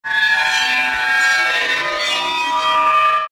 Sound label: destructive metal scrapes